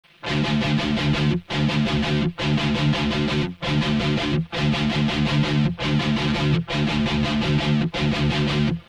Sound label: thrash mix